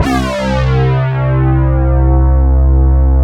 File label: SYN JX, SYN Analog; Sound & Vision; Gigapack I CD 2